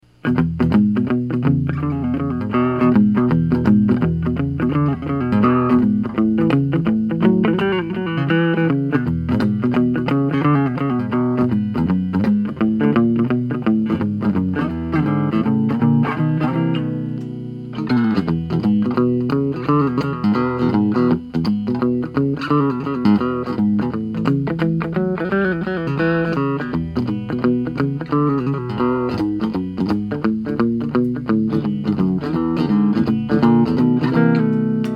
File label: pickup test, guitar